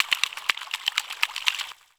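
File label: waters 120 bpm; Rarefaction; Poke in The Ear With A Sharp Stick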